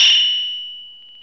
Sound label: FM Perc; Rap